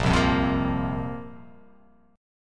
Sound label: A sharp Suspended 2nd down; strummed; A#sus2; JGuitar